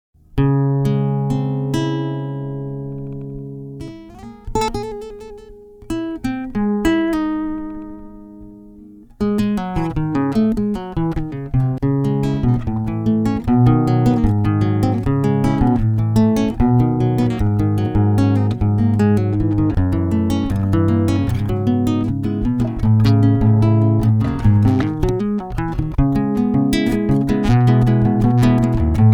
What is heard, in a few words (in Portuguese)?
chorinho